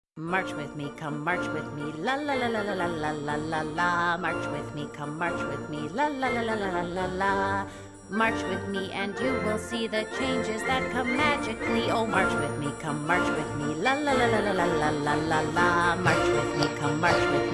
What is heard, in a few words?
Persian March vocal